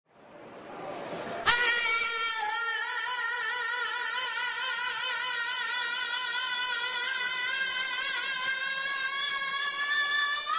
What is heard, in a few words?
screaming song live at art show; original very bizarre